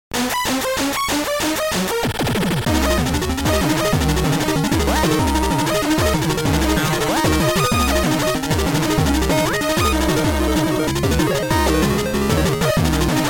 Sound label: jingle; weekly beats 2020